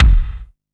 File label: Kick, Drum & Bass; AMG - Prototype Drum & Bass